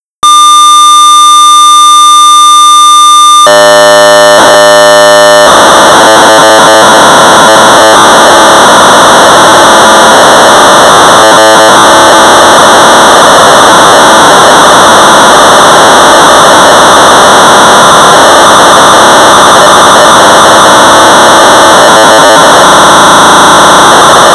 Sorcerer 1200baud; Grotnik wars; Ross Williams

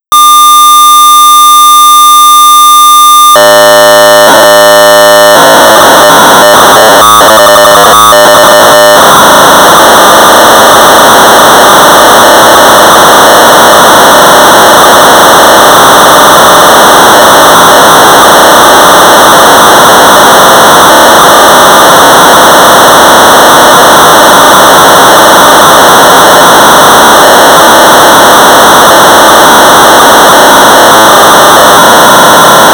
Sorcerer 1200baud; Chess 1.0; Sorcerer-Computer-Users-Australia

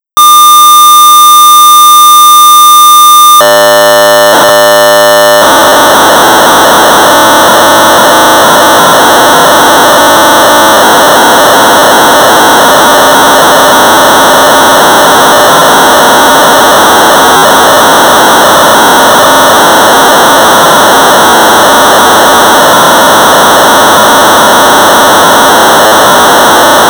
Sorcerer 1200baud; Airmail Pilot; BASIC

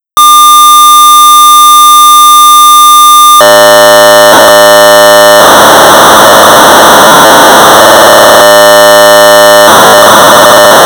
Sorcerer 1200 baud; Kilopede; 1982; Matthew.B.Hickey; System Software

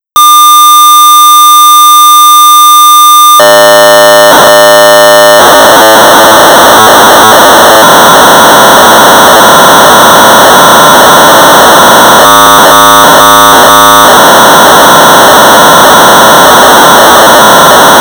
Sorcerer 1200baud; Gunner v.1.0; A star wars shootout; BASIC

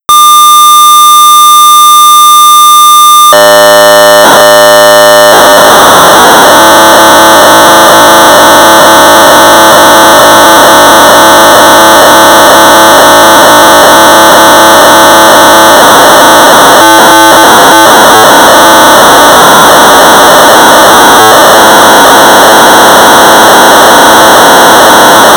Sorcerer 1200 baud; Racetrack; R.J.Greenwood and D.S.Hauge; BASIC

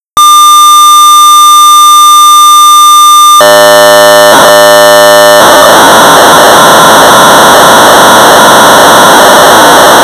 Sorcerer 1200baud; Cross-Up; Ted Crowley; BASIC

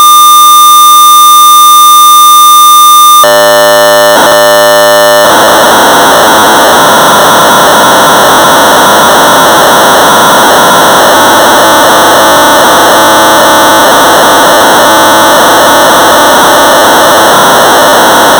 Sorcerer 1200 baud; Qubic (3D noughts and crosses) 1985; Toowooba Sorcerer Group; BASIC

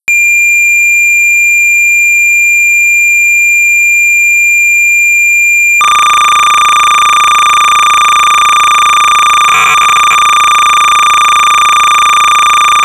Sorcerer 300 baud; Piranha; Interface Age; Ported by H.A. Lautenbach

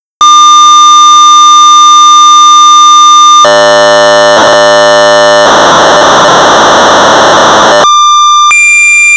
Sorcerer 1200 baud; boot

